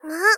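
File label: Moe, Angry, "Muu", Japanese female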